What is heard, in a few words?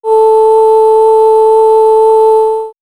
Swirls, pads; Sängerknaben; X-Static Goldmine